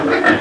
Pig snort short

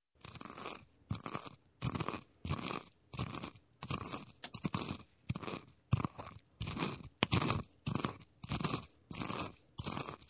Pestle and Mortar mixing

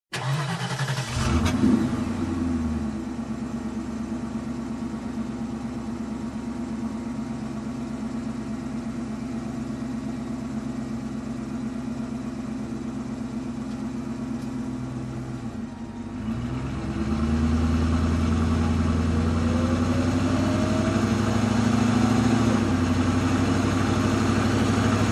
Truck engine ignition and driving around

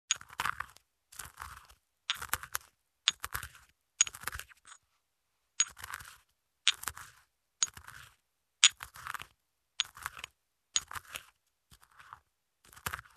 Pestle and Mortar mixing

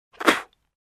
Spit

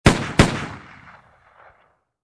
Gun shot twice

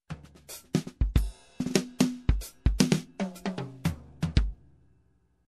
Funky Drum Groove

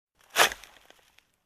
Diging

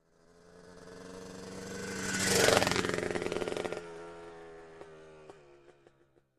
Offroad Motorbike passing by medium speed

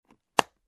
Simple case click

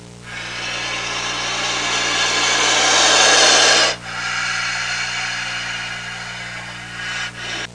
Forest cobra

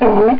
Sea lion single bark

